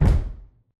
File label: dog day stomp; dognap